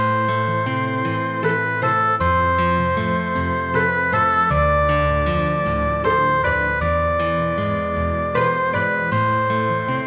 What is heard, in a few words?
Violin